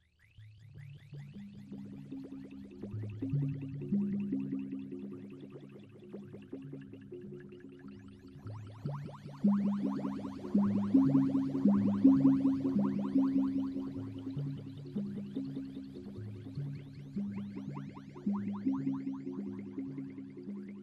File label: in can saucer attack